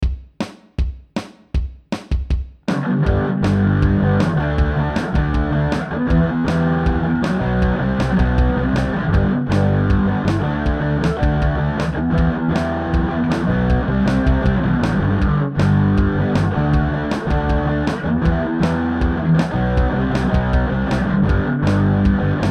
Mix, amp sim

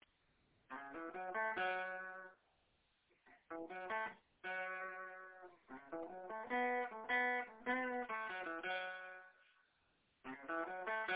blowin wind blues licks

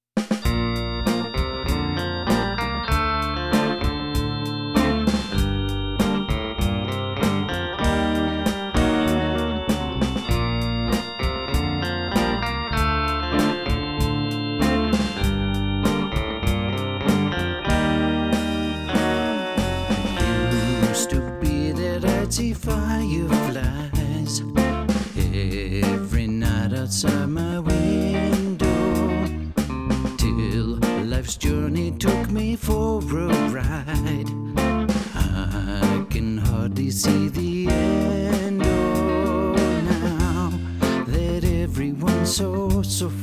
vocal Eq Comp; bus Fx; Goodbye Fireflies

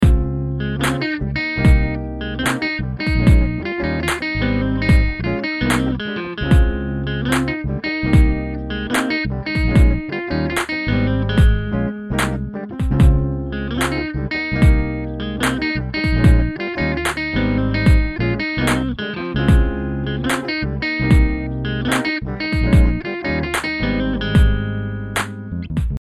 little Groove loop solo

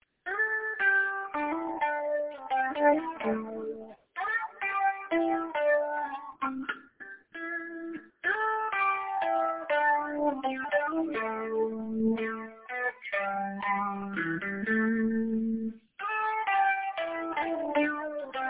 slow pentatonic minor melody

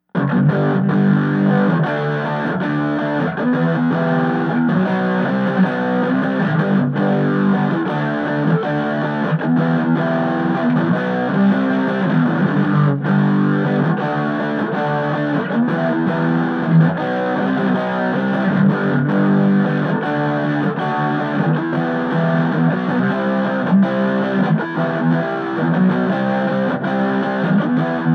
Main Rhythm Guitar, amp sim

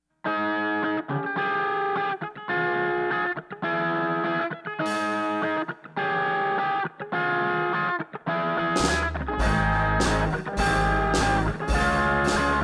no comp scratch mix

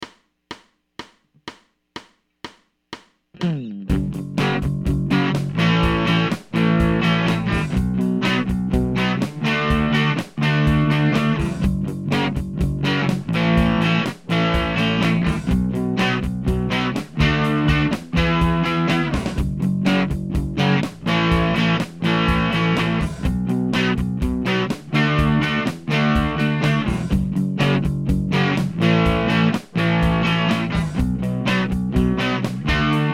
raw Scratch Mix

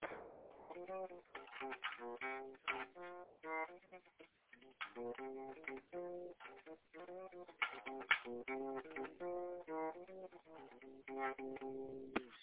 more barely audible bass

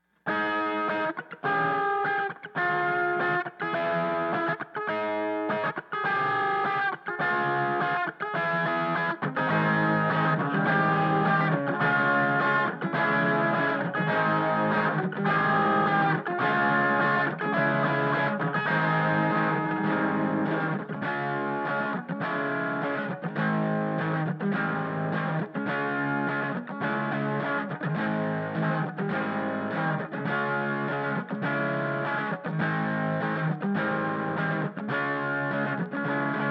less Gain guitar Bus

